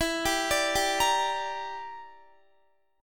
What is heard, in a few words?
E Minor 7th Flat 5th E, Guitar; Em7b5; arpeggiated; x,19,17,19,19,17